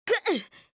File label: FEMALE damage